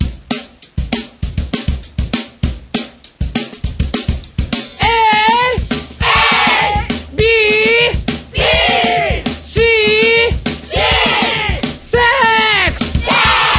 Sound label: beat; votemap